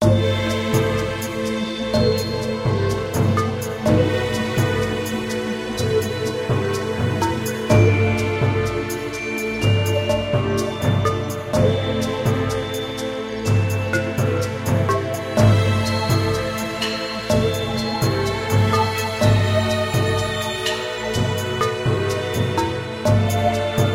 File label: Jungle Night scary; tales of monkey island